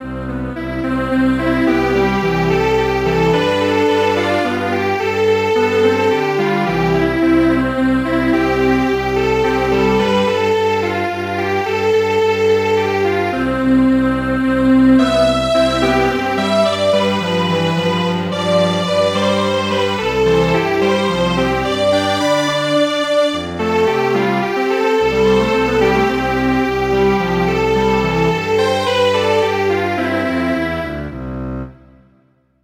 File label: Strings Quartet, traditional